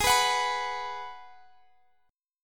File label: Ab Minor 7th down; Abm7; strummed